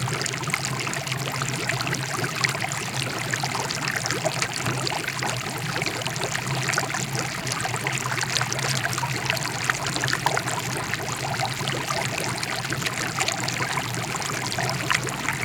Water, Bubbles, Cauldron, High; Poetry Project

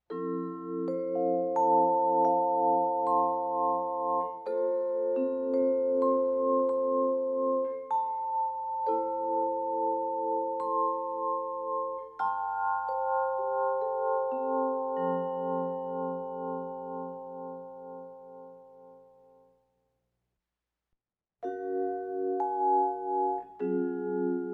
Vibraphone Theme dreamy; Electa Quinney

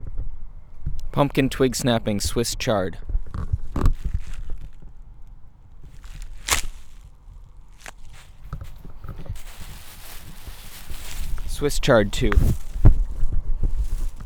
Pumpkin Stem chard, PUMPKIN HARVEST FOLEY

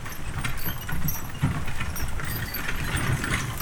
Horse Drawn Wagon; Electa Quinney

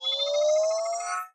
Build Up Laser Load; Universal SFX

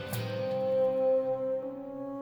Music Only

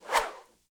Whoosh, Arrow, Feather, Low; Universal SFX

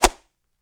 Whoosh, Arrow, Feather, Snap; Universal SFX

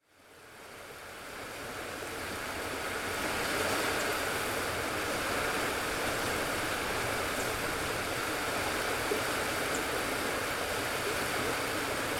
AMBIENCE WATER; CANA ISLAND; Interactive Lighthouses

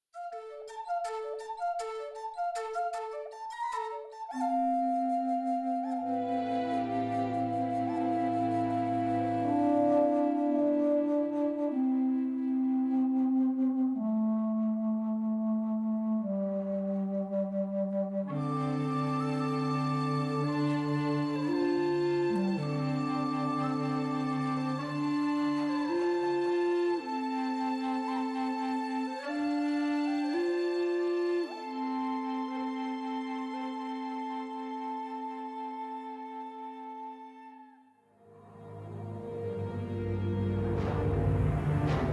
Music Only; Electa Quinney

